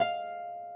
harp

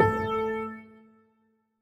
piano; osu

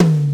TOM

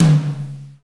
Drum Low Long

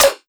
snare

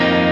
x 3 2 0 x 0 E7(11)

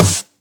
Snares

